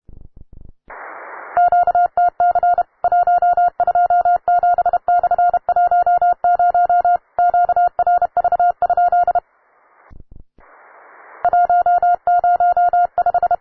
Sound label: cw, beginner; Training QTCs; QTC list